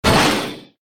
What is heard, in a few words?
Hitting Metal Fence, kart; Poodletooth-iLand